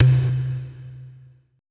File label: E LOG C, ETH DRUMS